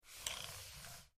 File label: Short Mouse Slides